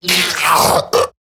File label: Death Male; en; Dialog; Barks; Battle; Grineer; Eximus